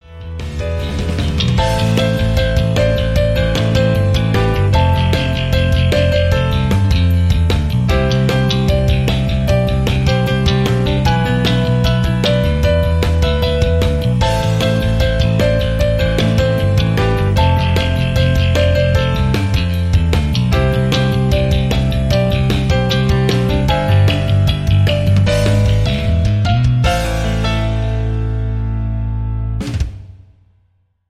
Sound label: Sample rock